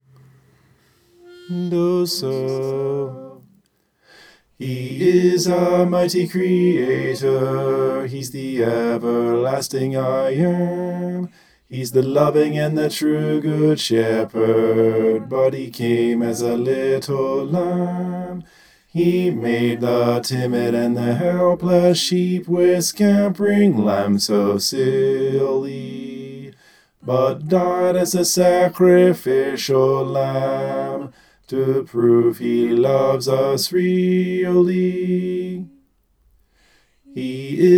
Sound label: bass